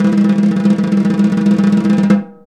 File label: SNR SNARE